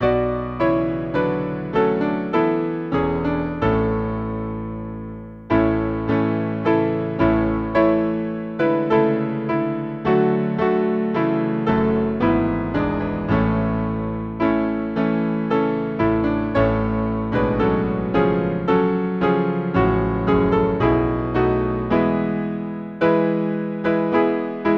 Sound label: Hymn piano; Angels from the Realms of Glory, Iris